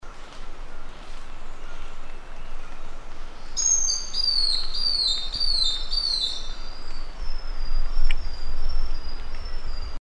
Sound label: Long tailed Broadbill